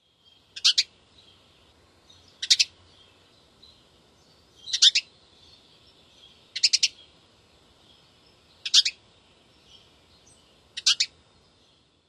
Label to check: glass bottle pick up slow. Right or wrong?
Wrong